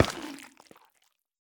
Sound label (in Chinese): 幽匿催发体踩踏声